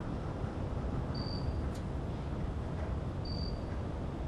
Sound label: city loop; nimbus station